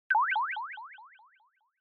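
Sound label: Onomatopoeia Negative Low Delay, ネガティブなコメディ系SE; オノマトペ ネガティブ; 低 ディレイ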